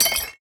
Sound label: Broken Glass Scatter